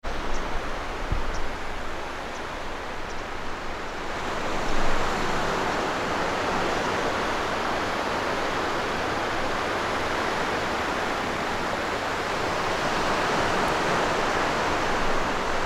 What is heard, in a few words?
harbor